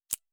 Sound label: click